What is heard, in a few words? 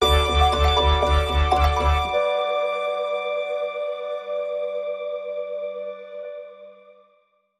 Mbira, Sting